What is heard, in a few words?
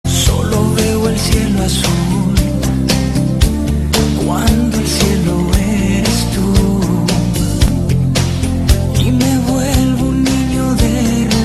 Romantic music